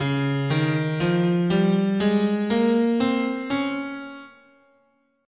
C sharp Lydian, guitar, scale

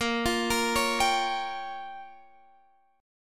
A sharp Minor 6th, down, Guitar, Standard; arpeggiated; JGuitar

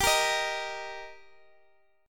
G Minor Sharp 5th G, chord

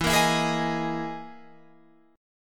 E Major 7th Flat 5th E, Guitar, Drop D; chord shape

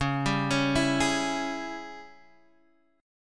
Ab Major 7th Suspended 4th Sharp 5th Db

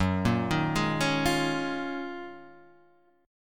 D sharp Minor 11th F sharp, chord

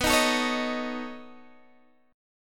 B 9th B down Guitar DADGAD

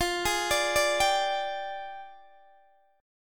F Minor 6th Add 9th F down Guitar Open D

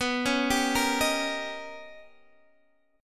D sharp 7th B; D#7/B; arpeggiated; chord shape

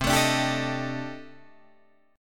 C Minor 6th Add 9th, C, down, Guitar, DADGAD

